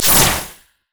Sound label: lunge; LoL; fiora